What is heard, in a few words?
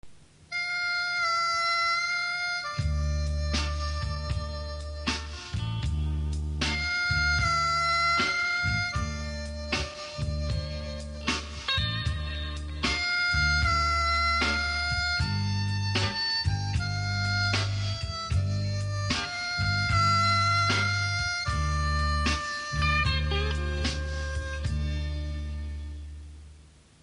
rainy days, harmonica